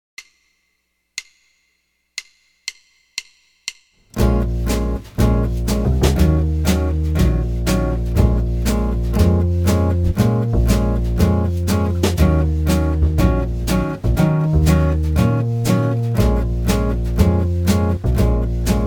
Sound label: Minor Blues Bb Minor Root 6